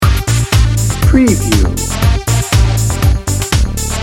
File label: party in motion loop